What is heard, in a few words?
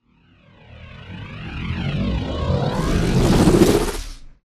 cloak deactivate; Titanfall 2